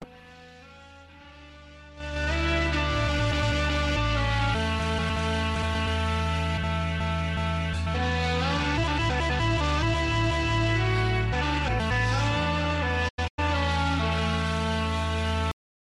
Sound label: cool samsung ringtone; samsung a20